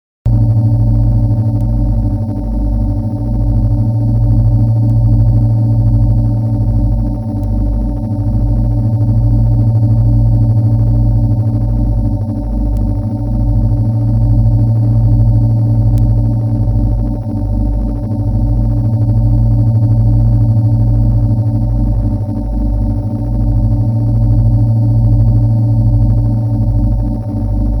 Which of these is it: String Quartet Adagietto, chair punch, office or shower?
office